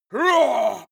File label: male shout hit